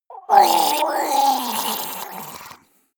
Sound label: monster die gurgling high; kreaturen